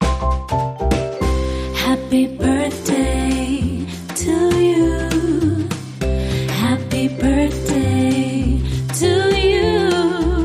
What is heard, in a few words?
Female Vocal Jazz Happy Birthday